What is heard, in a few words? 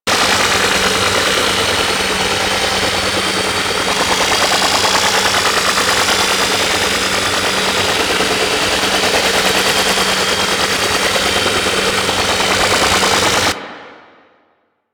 Stealth Movement Slow Engine Loop; Warframe; Vehicles; Archwing Stealth